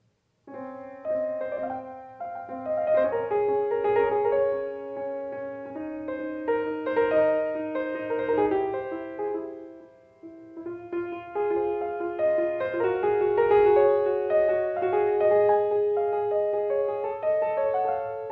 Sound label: Solo Piano, Live; Creative Music Studio, Woodstock, NY